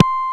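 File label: JV house bass, JV-1080; Syntec; Wall of Sounds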